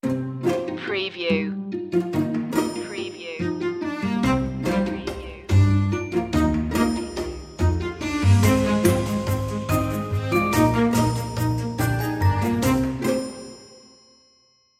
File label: curious machine